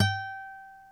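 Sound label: G4 classical guitar; InVision Interactive; Lightware VOL-1; Instruments & Percussions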